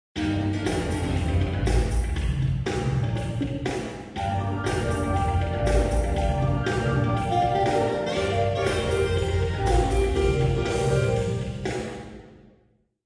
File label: music 1500ms far auditorium